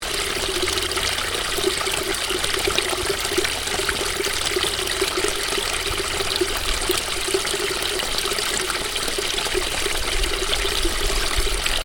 Stream gentle loop, Stream: gentle water running, loop; Nature; Stuart Duffield